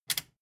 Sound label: metal button click power on fast